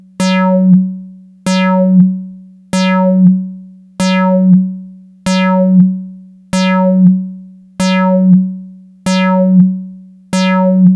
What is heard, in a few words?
vcf ar fast attack slow decay high res; analog synth 101